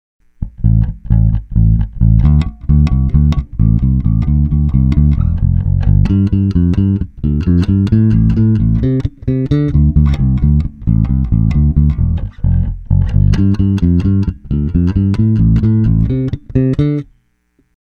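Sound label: AFTER 132 YUBI ACTIVE, ベース, Bartoliniプリアンプ; Bartoliniアクティブ・指弾き（EQセンター）; TAURAMベース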